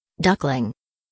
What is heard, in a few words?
duckling